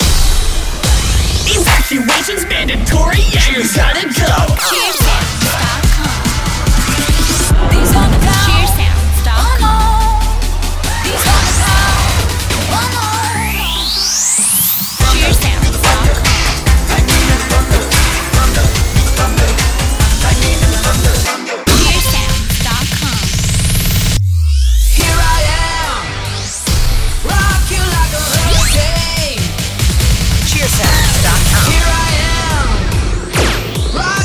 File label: storm theme; thunder rolls